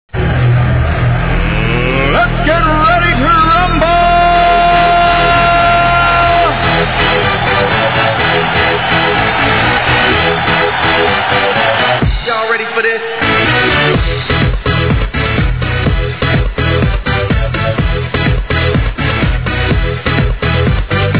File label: rumble